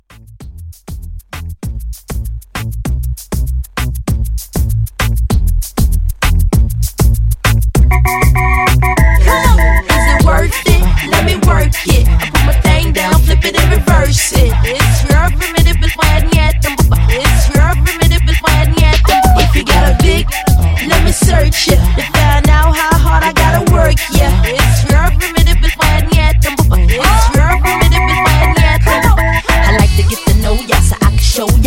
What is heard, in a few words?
Dancehall Mashup, 98